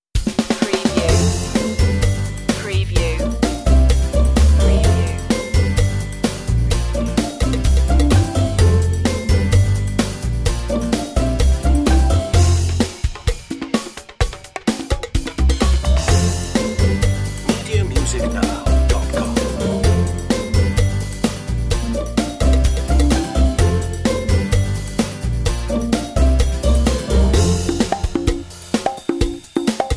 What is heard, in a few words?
life style tv light comedy